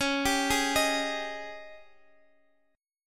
Ab Major 7th Suspended 4th Db

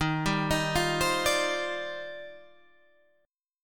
D sharp Major 13th, guitar chord

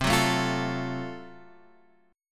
Gb Minor Major 7th Flat 5th, chord

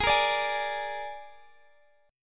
Ab Suspended 2nd Flat 5th chord; Absus2b5; strummed; chordshape; x,x,18,15,15,16

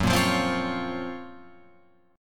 F sharp Major Sharp 11th, Guitar, Open F; strummed; JGuitar

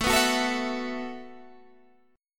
E Suspended 2nd Suspended 4th A

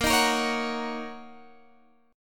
D sharp Minor 7th

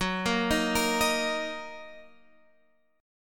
D sharp Minor F sharp

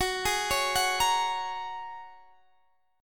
A sharp Minor 7th Sharp 5th F sharp, chord

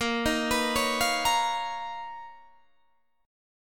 B Major Sharp 11th Bb

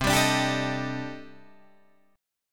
C Minor 6th Add 9th, C, Guitar, Drop D; down; chord shape

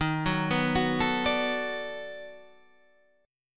B 7th Sharp 9th, guitar

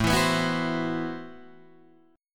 A Minor 6th Add 9th, Guitar, Drop D

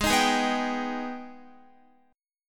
Ab Minor Major 7th, Guitar; strummed; 4E,4A,5D,5G,5C,6F; JGuitar

